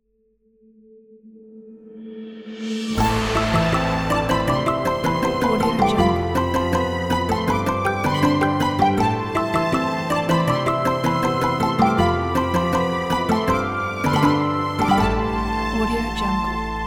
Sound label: tree house logo